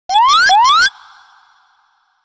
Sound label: Collect Mine Eggs; Banjo-Tooie